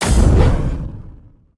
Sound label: projectile; smite; poseidon